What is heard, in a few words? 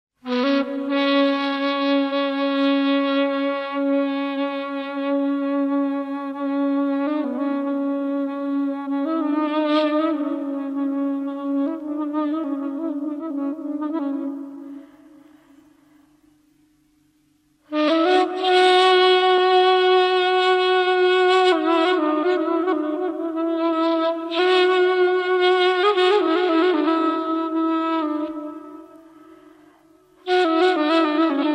Ney